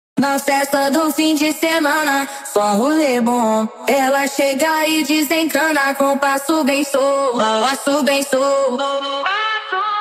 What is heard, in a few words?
Phonk